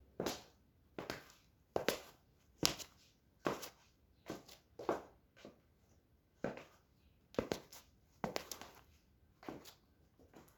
Person In Heels Pacing

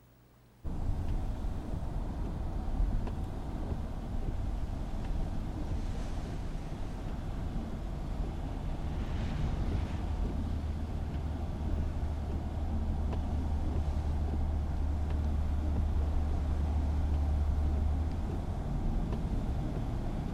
Interior Car Atmos Wet With Wipers

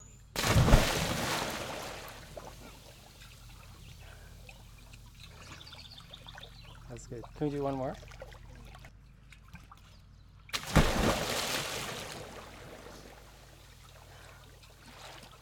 Splashes Jumping In Pool Water; Mineral Springs Rd Hamilton 3pm

